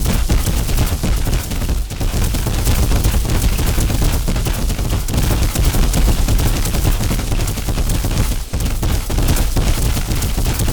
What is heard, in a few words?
roof crab run fast loop; Hollow Knight: Silksong